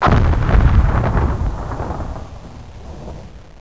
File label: explod